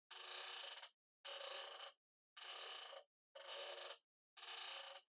Flip Clock Far Alarm Dial Mid

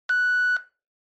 Handheld Transceiver Signal, Tx Beep

